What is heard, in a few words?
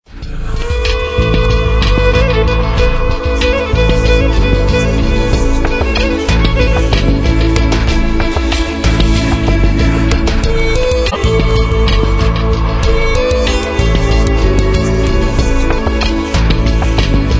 Spanish Funk; cyberCHUMP